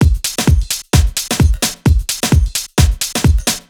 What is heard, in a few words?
Drum Loop 130, Downtown House; Drum Loops 130bpm